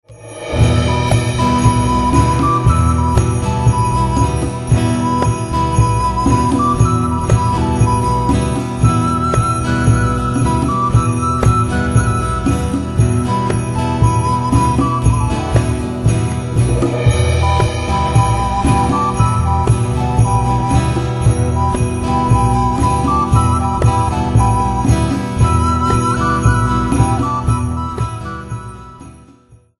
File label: The Healing Flute